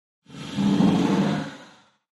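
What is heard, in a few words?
Space Door Open Slide, doors high tech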